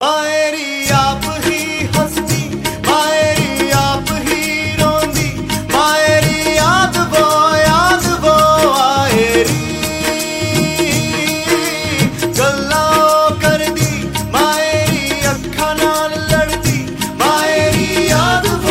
Lovely Pop

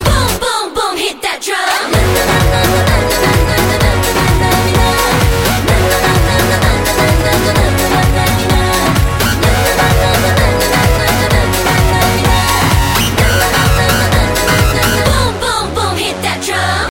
Hit That Drum

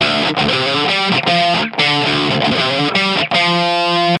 Guitar Low

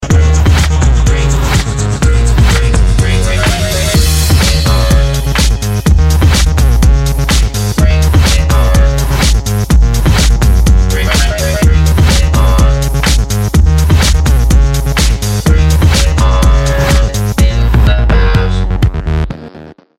Techno; Uber

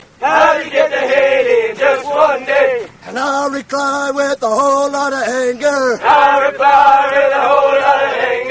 Army Cadence

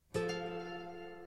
Guitar Clic

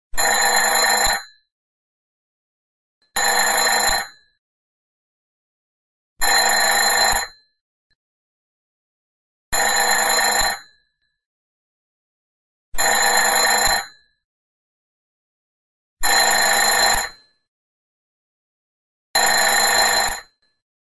Business Retro Ring

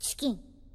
Chicken

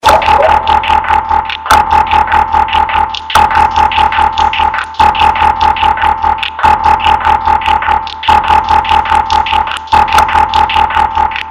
Iphone 4s Sensor

